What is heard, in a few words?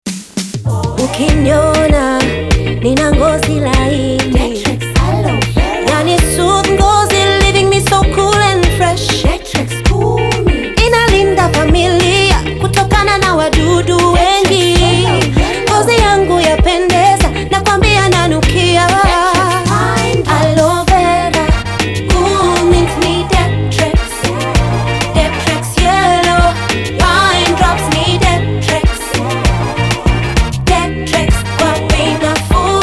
Jingle Mix; Detrex